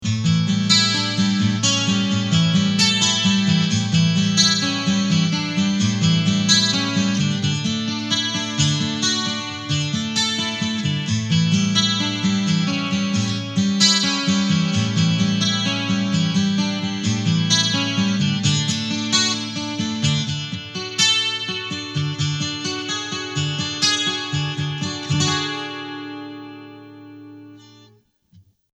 Digital 12 String

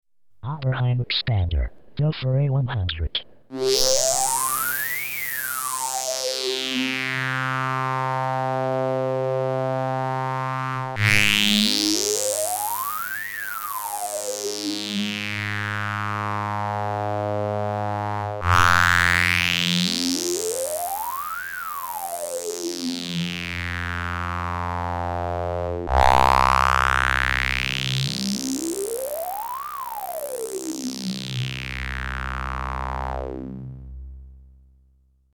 A106 2 Pole High 1 Pole Low

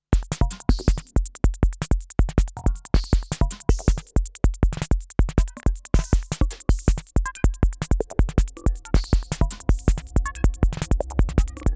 Roland TR 8S Pattern

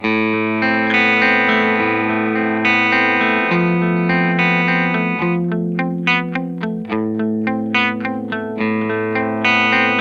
Boss IR-2 Twin

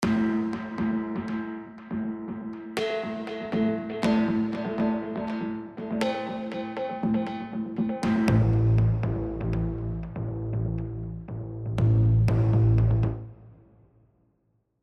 Hybrid Pedals Straight

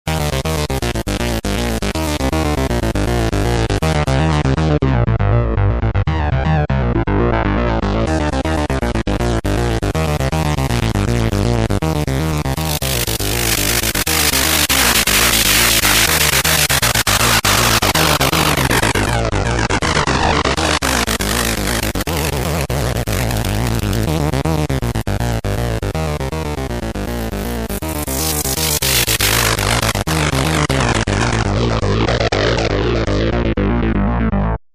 Voyager touchpad

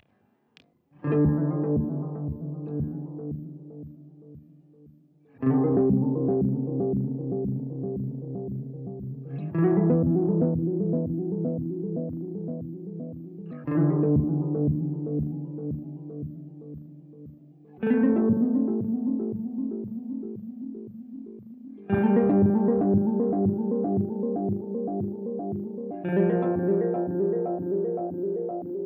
Beetronics Seabee; Factory Preset

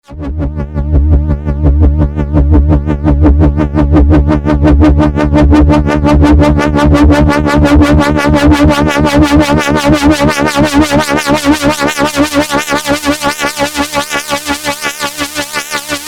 waldorf rocket pulse lfo

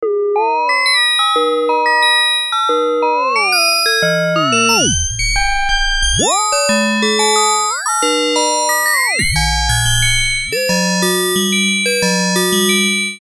exp Fmod

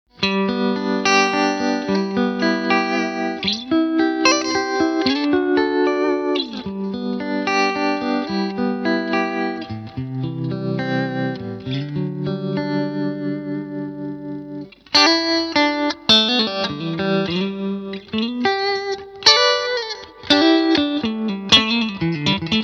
Fender Tone Master Princeton Reverb clean Tremolo

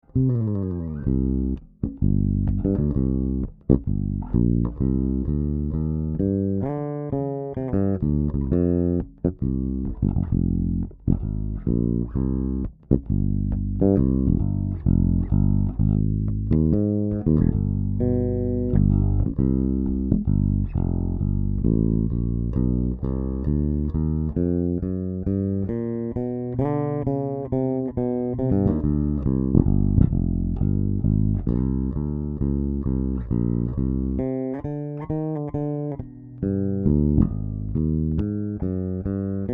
L-2500 Swing

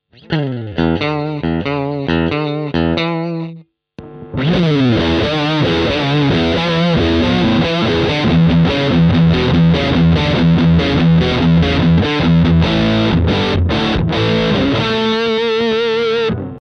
Gain max Some Riffs; BM20 ULTRA

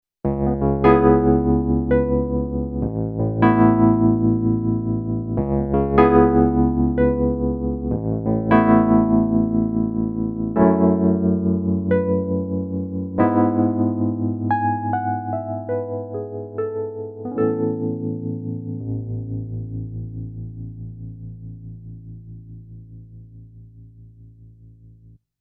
CN35 60s EPiano

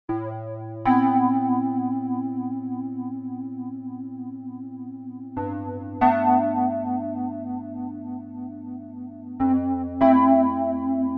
Roland MKS-10 Planet-P e-piano flanger

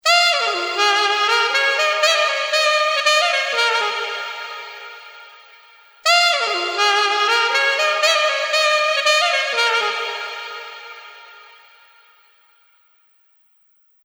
Sax

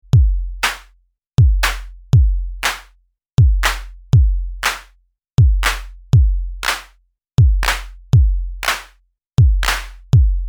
DoubleDrummer Clap; Audiothingies

